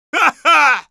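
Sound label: laugh short, Spanish; Heavy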